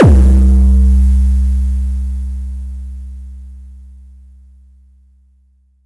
boom, Kicks; ModelCycles

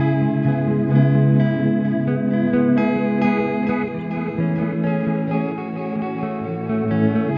Tapeloopgtr, Guitartronics; Sonic Foundry (Sony Creative Software); Ma Ja Le Ethereal Textures

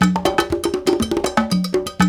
World Dance; Spectrasonics - Supreme Beats; Compasa

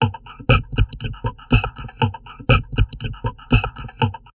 TALK 120, Drum Loops Crazy Processed; USB Soundscan vol.07; AKAI

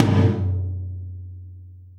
Tom, Gated Reverb; musicradar

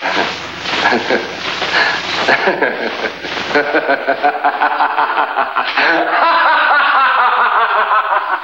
Laughter; Sonic Foundry (Sony Creative Software)

